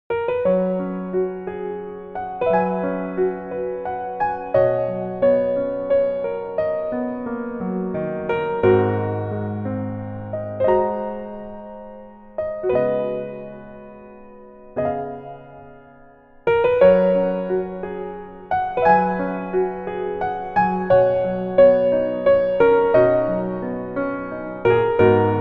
Piano ver, 멜로디MR

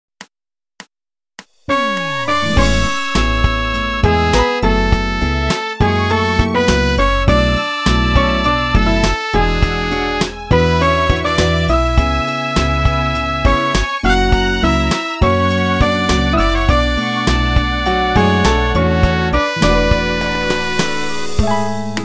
멜로디MR

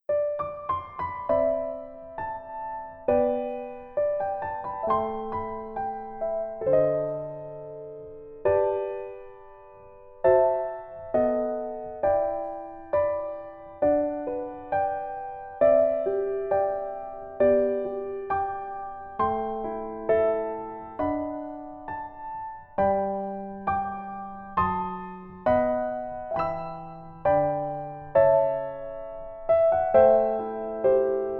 Piano ver, 여자키

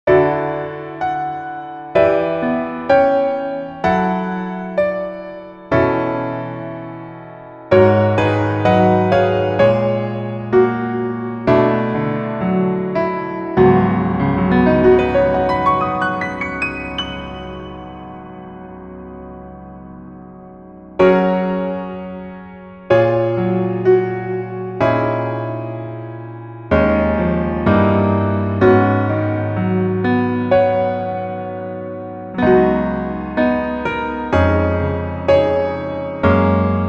Piano Ver -1키

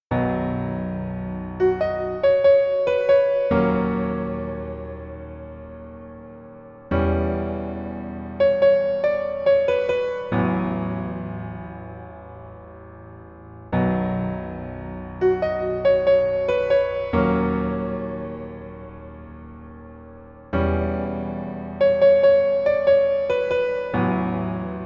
PIano ver 멜로디MR -1키

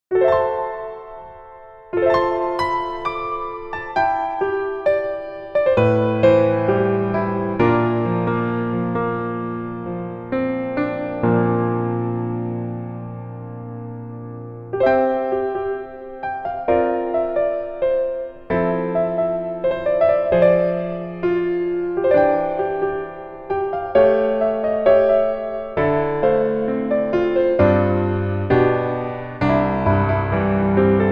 Piano ver, 멜로디MR

